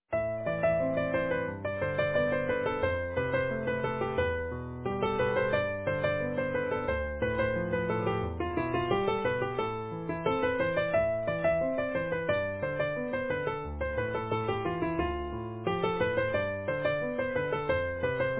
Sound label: Easy Piano